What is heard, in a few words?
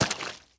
meat, player steps; quake2